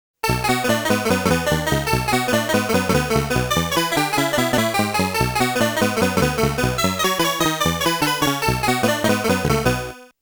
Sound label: Bonus Round Over Music; Mappy; Namco Classic Collection Vol.1; arcade